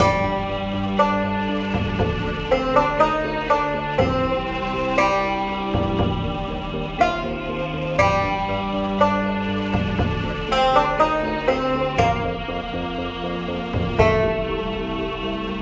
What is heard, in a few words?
Tension Loop 120bpm 8bar; project zomboid